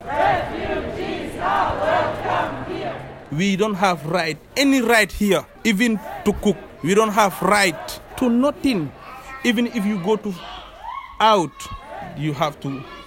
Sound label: jingle